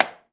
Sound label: CASE, SWITCHES; AKAI Anime Sound FX